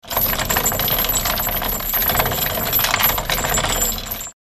Metallic chain pulling rattling

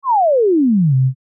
Line graph decrease animation infographics

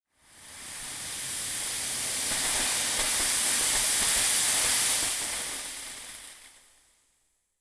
Event smoke machine fogger running; machinery

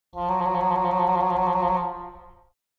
Cartoon english horn trill

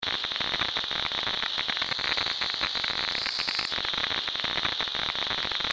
Radio static noise loopable

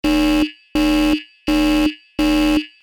Strong futuristic alarm danger loop

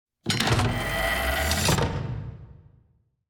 Sci fi door sliding opening

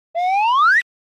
Cartoon slide whistle up

